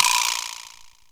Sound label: VIBSLAP; roland2